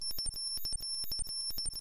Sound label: loop